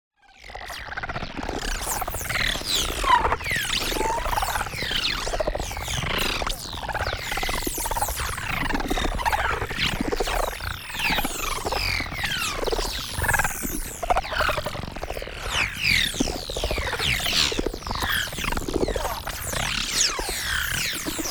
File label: PARTICLES LARGE FAST Laser Injection; NEON; boom library